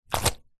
household wet cloth drop into ceramic sink splat squelch; zapsplat